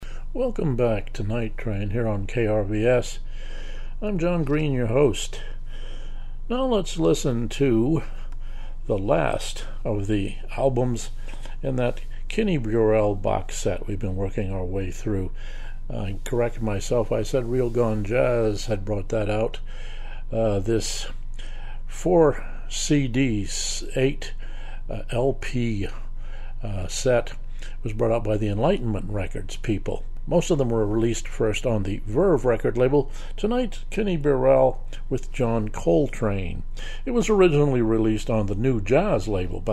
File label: jazz